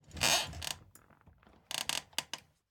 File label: Office Wheelchair; Nikko Barrera-Amaya; Office hits